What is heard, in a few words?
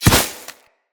Great Sword Melee Impact Vegetation; Weapon Impacts; Founders Great Sword; Environment